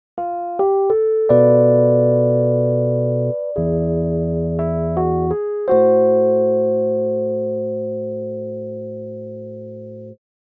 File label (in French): bm7b5 e7 am6 melodie; jazz; On peut en revanche sans problème jouer E7